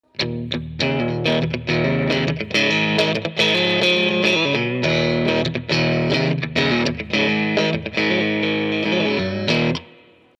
Ampeg GVT Combo Bluesy

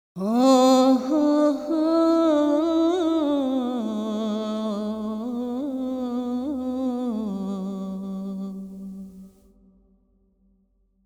VoP Abandoned Abbey 6sec 100 Percent Decay

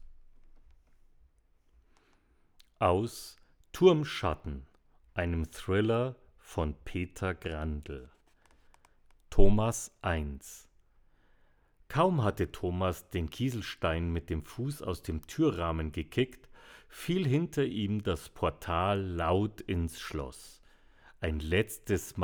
WA 47

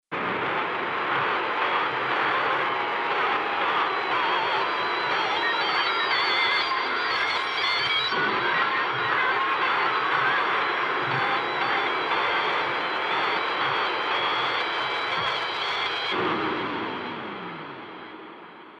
Envelope 4 Bar Decaying; Elysion 2; Uranium

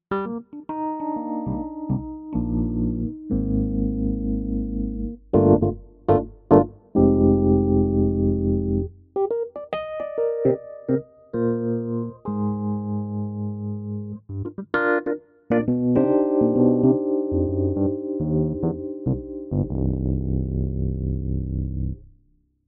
Nord Stage 3 EP; Nefertiti

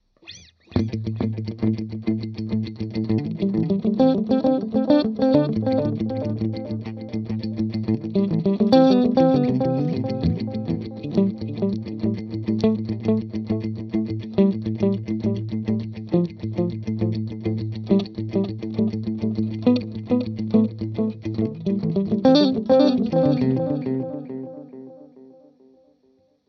Tape FX Send

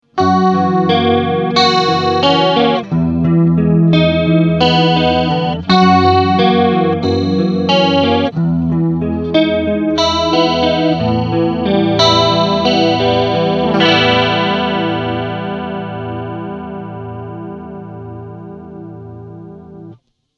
Vintage Chorus

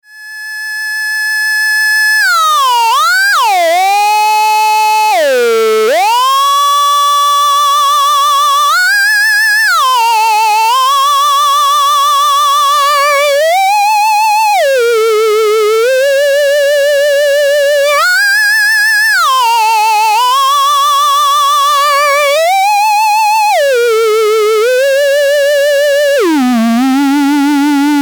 Solus Draculas Theremin

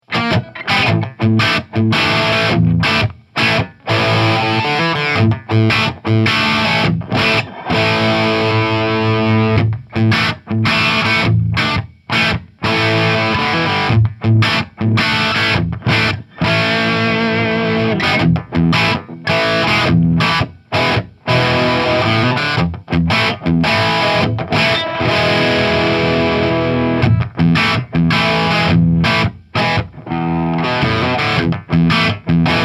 Bridge PU Crunch Both Voicings

